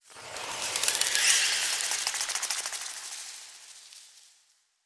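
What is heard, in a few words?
ambient bats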